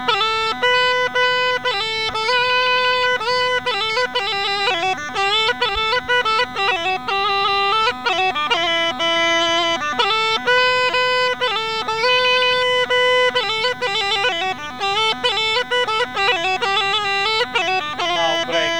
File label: Song-ish version + break, Rodope Gajada; Lesson Recordings; Vasil - Rodope Gajada Lessons